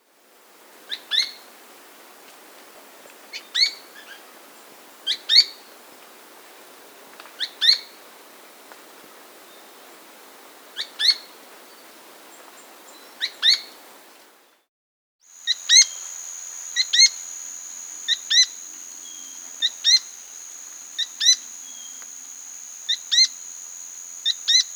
Azara's Spinetail, Synallaxis Azarae, Nature's Songs, S. America